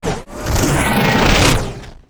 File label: wooshes transform sweetener